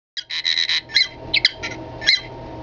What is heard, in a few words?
r2d2 sound; swsaga